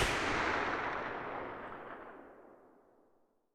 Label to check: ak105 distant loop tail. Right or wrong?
Right